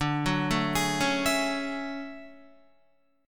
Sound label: D Major Sharp 11th, down Guitar; arpeggiated; JGuitar